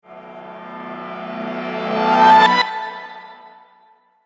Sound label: Riser Reverse Piano; Rise And Dive Sound Effects